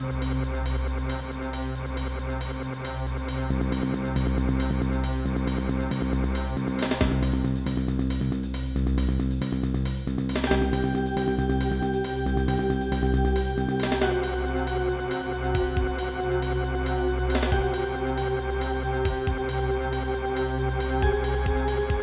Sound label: theme